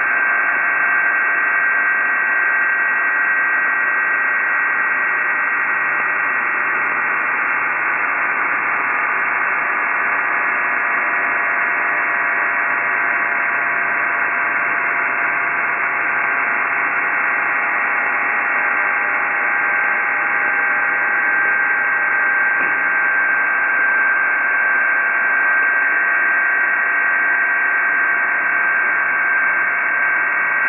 Italian MIL VFT on 29699 kHz; Brescia

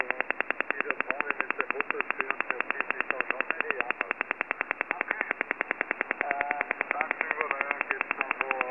Russian OTH Radar 10 sweeps-sec on 14305 kHz

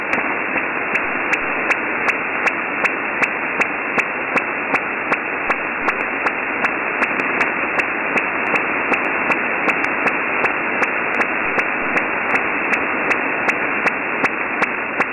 USA new HF Radar on 7067 kHz

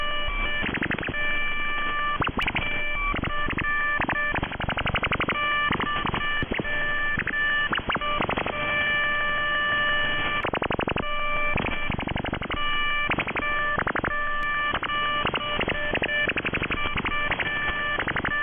Chinese multitone on 14321 kHz

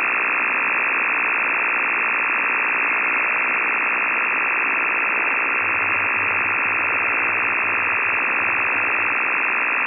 Computer monitor on 18.1 MHz

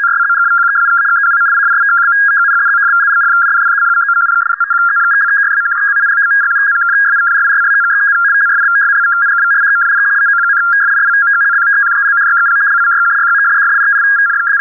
CIS printer 81-81 Bd 250 Hz shift

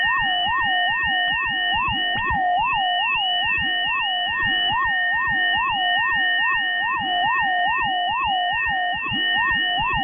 Mysterious siren from Vietnam on 18112.5 kHz

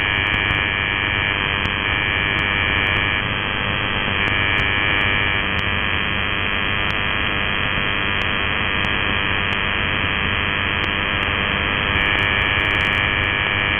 Large LCD TV on 3515 kHz (USB)

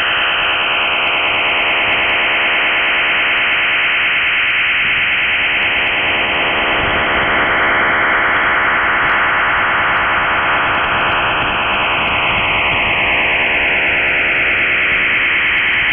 Turkish MIL Stanag 4285 PSK8 on 10112 kHz; legal operation

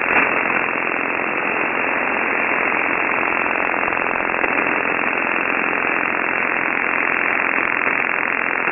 Chinese OTH Radar 43 sweeps-sec on 7 MHz

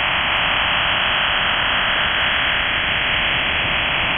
OFDM 60 tones Russian MIL on 7000.8 kHz

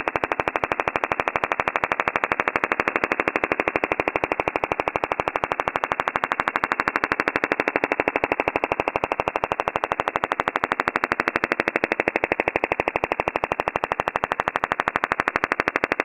Cypriot OTH Radar 12.5 sweeps-sec on 21120 kHz

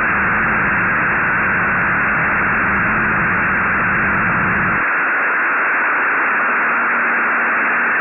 TELEFAX device; made in Thailand

